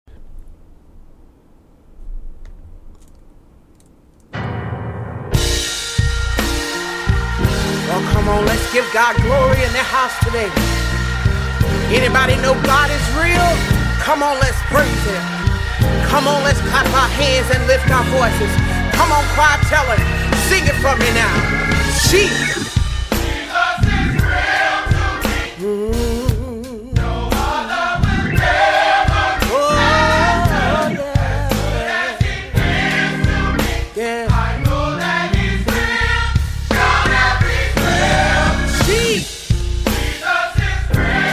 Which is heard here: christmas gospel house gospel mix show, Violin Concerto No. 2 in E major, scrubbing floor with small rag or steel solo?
christmas gospel house gospel mix show